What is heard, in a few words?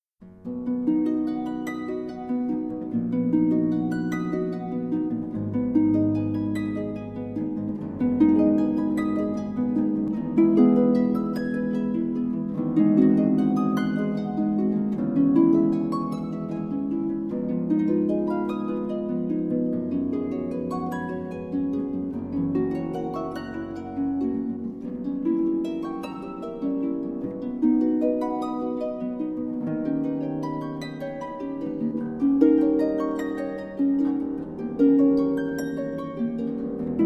Improvisation, harp